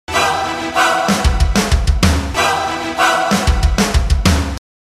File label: Melody, RingTune